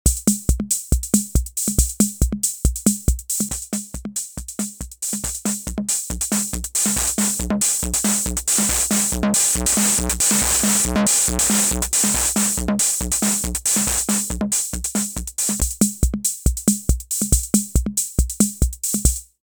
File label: RD 6 Distortion Hihats